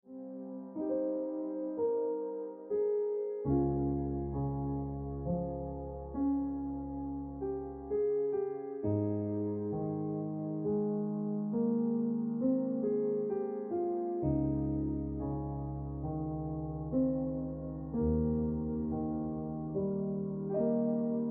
Ringtone Piano Music

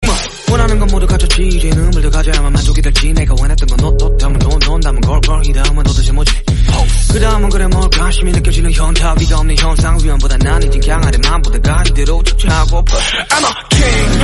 Korean Rap

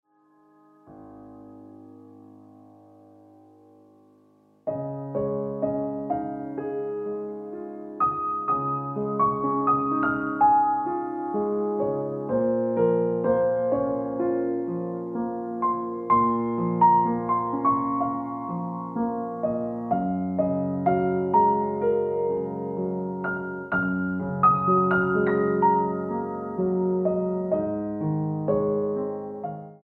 Ringtone Piano Music

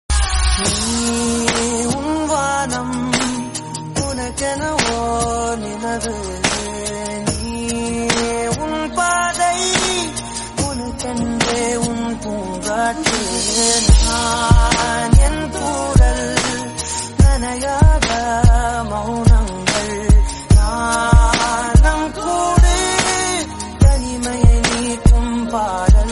Friendship Song Tamil

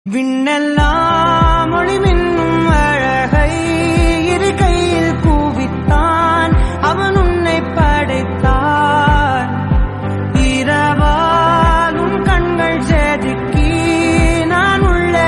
Tamil

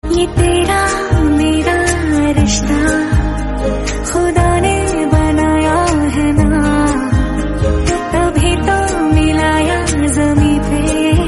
Lofi Hindi; Jalebi

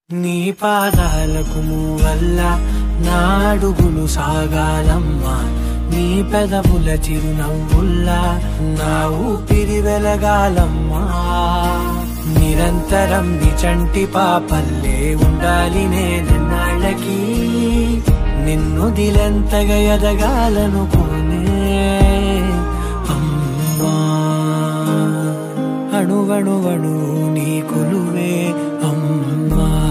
Ringtone; Kanam

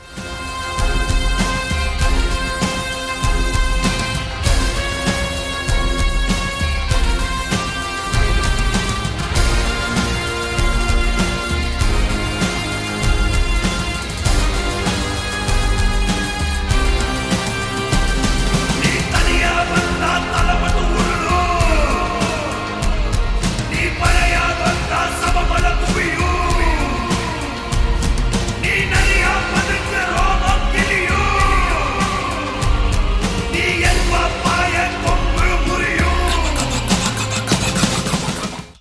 Bgm

